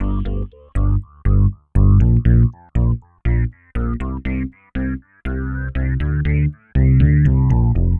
Synth Bass; Bass X

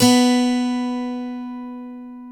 B2 12-ST AC, AC.12-STRING; InVision Interactive; Lightware VOL-1; Instruments & Percussions

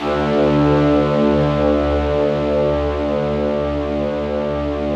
BIG ORCHESTR, Yamaha VP-1; Music Store; 90's Sample CDs

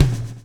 BLUE FC, BLUES; Spectrasonics - Backbeat

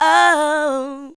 ADLIBS 90; Ueberschall; Jam Box

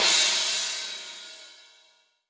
Cym Big L R, Alesis D4

